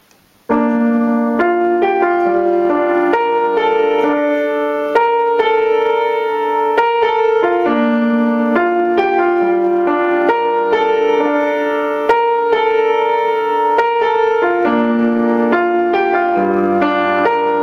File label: Piano Song